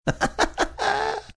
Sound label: cute laugh